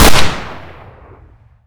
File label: makarov, weapons